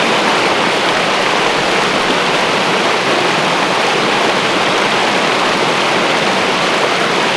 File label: rapids, rivers; Zero-G Ambient 1